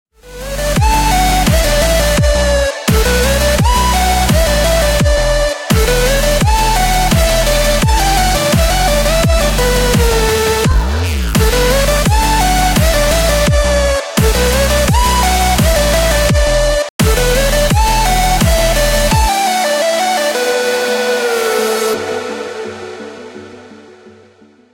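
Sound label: background music crossfade; Invaxion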